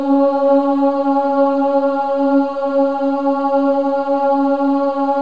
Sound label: OH C#4, WOMEN OHS; Spectrasonics